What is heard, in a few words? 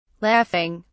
laughing